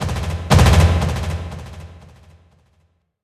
ambient port; World Of Warships; port3d

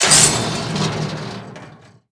metal drop; World of Tanks Blitz

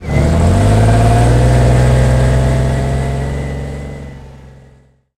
chieftain engine load; engines; War Thunder

